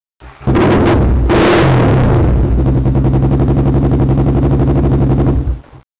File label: big gun